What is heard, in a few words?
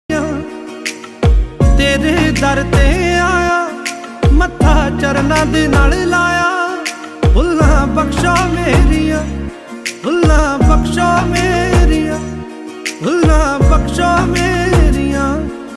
cristmassong; happy cristmas day